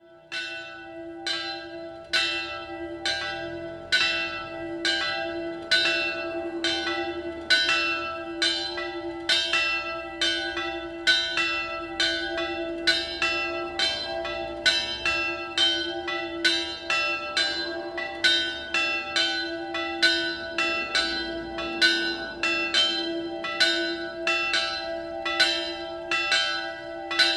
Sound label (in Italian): Gorizzo Doppio, campane